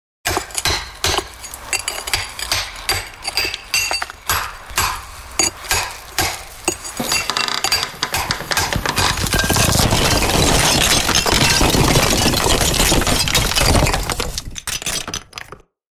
Sound of chiseling a wall with a hammer resulting in the whole place collapses; Diy Disaster; Hammers